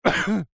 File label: human male middle aged single cough